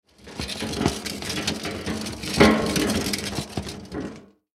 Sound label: Trash Can Roll, Trash Can Roll On Wheels Metal; Garbage; Household; Blastwave